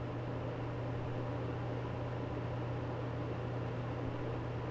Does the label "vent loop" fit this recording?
Yes